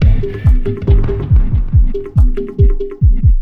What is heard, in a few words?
Electronica Drumloop; Drums